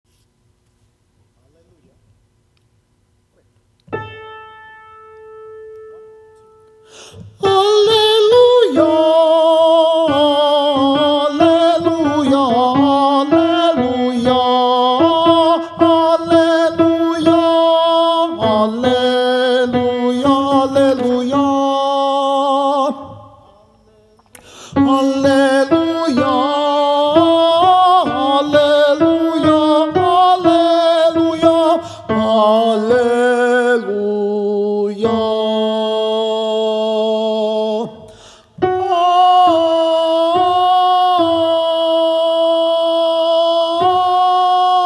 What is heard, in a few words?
alleluia alto